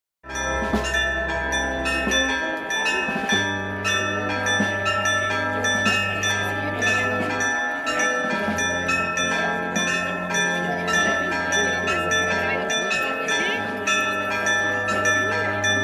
audio campanas ermita san julian

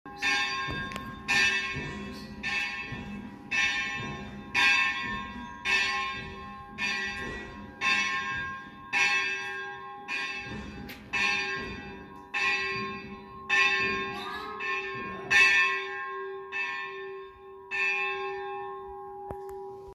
audio campanas; Ermita san martin

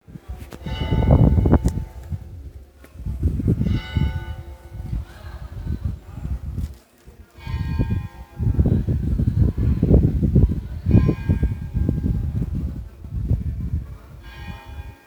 iglesia; iglesia de la encarnacion pozo alcon

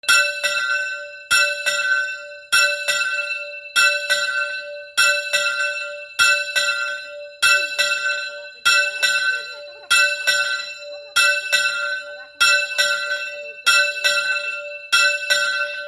campanas ermita; de nubla la iruela

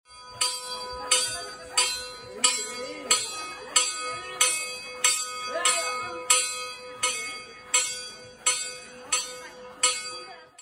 Sonido Campana; Montesion

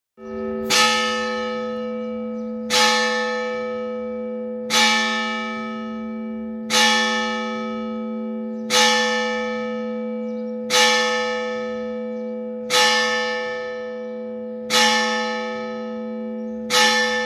Campanas parroquia

